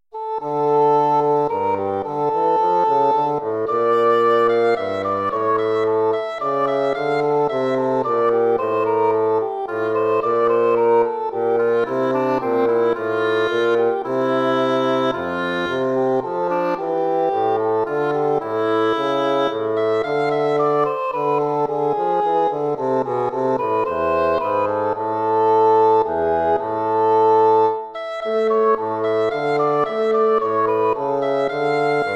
courante

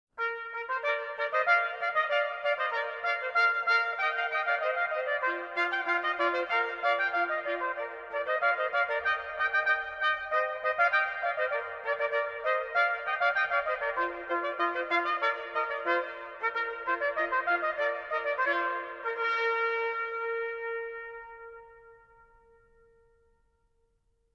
trumpet tune; charpentier marc antoine bruit trompettes

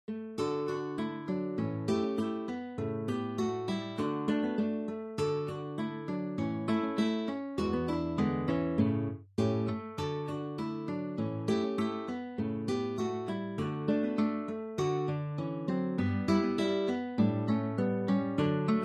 village bells; weidt andrew

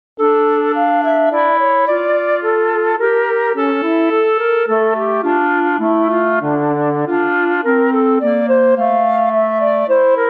minuet minor

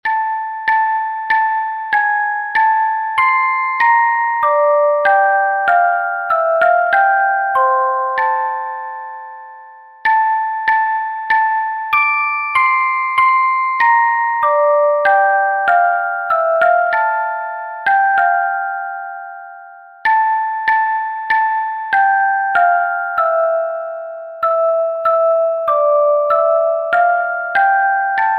children handbells; various composers collection

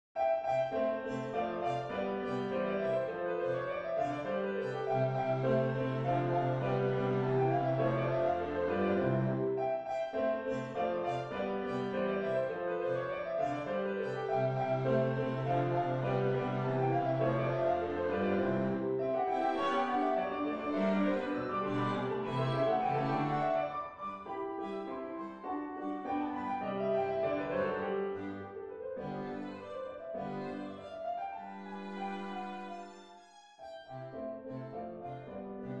sonata major for piano and string quartet

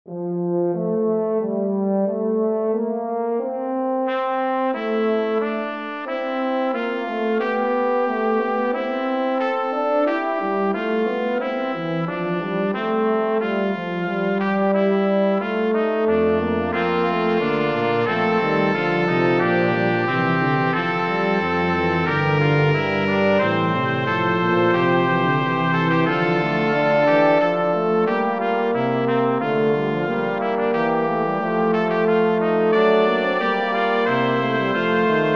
chorale for brass quartet; bach johann sebastian; christus der ist mein leben

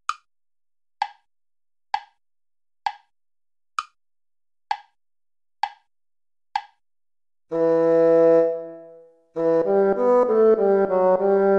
gigue

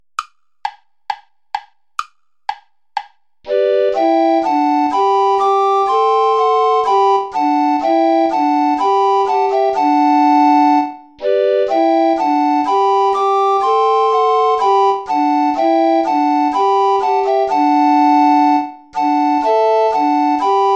play along without tenor recorder; schutz heinrich wohl denen die wandeln

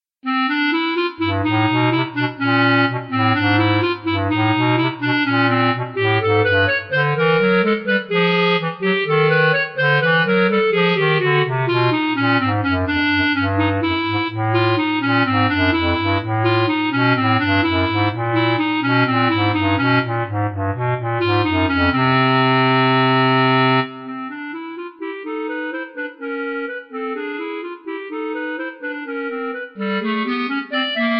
staccato legato; mikrokosmos

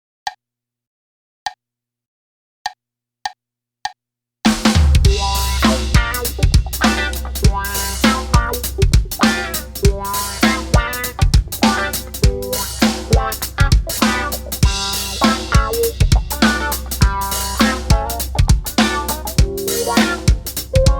funk groove

